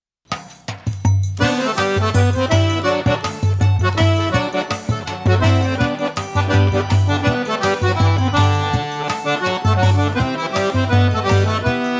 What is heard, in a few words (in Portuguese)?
musica instrumental